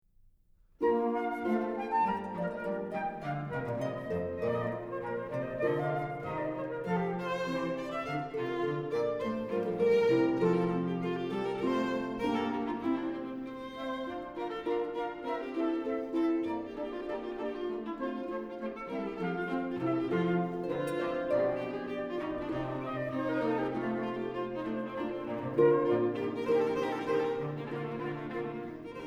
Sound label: Trio in B Major